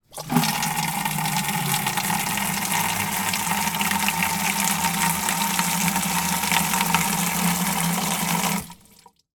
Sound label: household watering can plastic fill from water butt; sound effects one